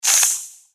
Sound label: mantis hiss; npc